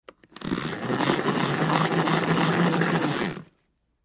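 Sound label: lettuce leaves sounds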